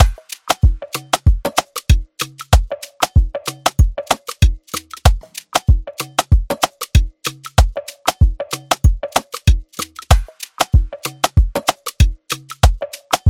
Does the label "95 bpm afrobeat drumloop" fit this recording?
Yes